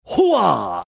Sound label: hoowah